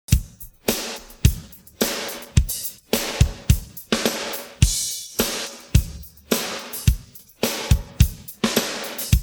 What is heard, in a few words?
pattern, drums